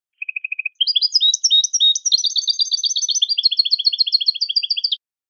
acanariado

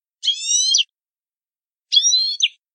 quejas simples

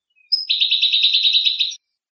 bibleos golpeados graves, campero

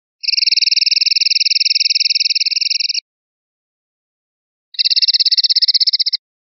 carretilla con e, pardillo

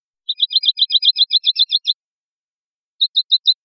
flin flin, pardillo

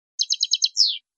chacheada; campero

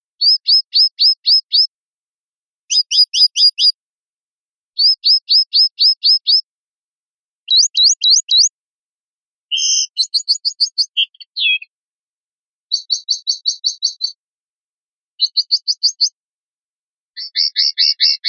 bisbeos; pardillo

